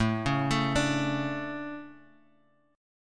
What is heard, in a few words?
A Major Add 11th A, chord; Aadd11; arpeggiated; JGuitar